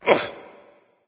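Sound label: nonverbal grunt lift; smite; voicepack; ullr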